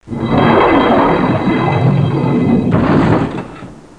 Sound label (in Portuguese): cripta; 7invasores